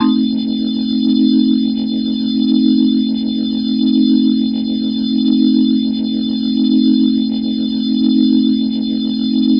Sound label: Organ A Dim; Hip-Hop; Organ Licks